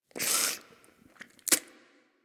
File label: Wheeze Close; Ambience; DE; Warframe; Infested Eris